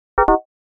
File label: Error notification alert